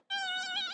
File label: cry; teefault; ddnet